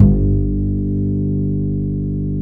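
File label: double bass G1, Jazzistic; Univers Sons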